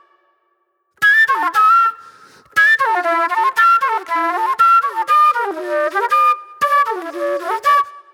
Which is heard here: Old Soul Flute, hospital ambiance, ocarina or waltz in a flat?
Old Soul Flute